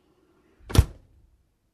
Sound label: Kitchen Cabinet close